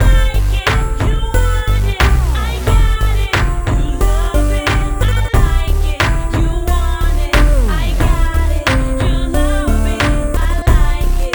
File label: hip hop